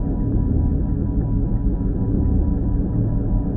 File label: TENSION